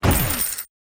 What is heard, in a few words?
lose coin